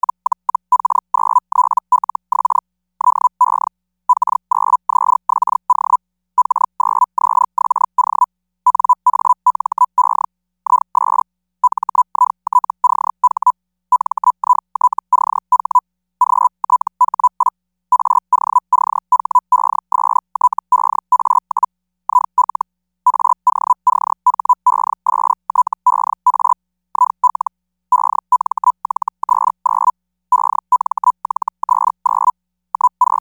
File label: feld hell